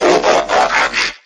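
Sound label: transformers transforming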